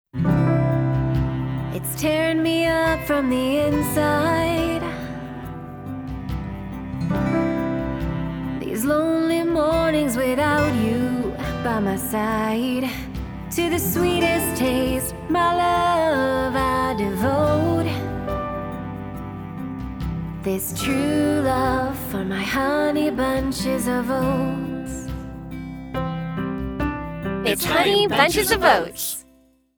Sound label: women singing